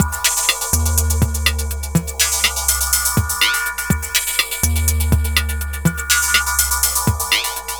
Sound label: Elektro, Processed Percussion